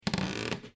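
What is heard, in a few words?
creak floor; SFX Knarr Golv1; Granny 3; Android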